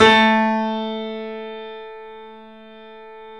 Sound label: A, 3-D Audio Collection, 3D Pianos, Yamaha C7; E-MU Producer Series Vol. 5